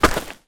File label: footstep